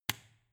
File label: switch turn off hard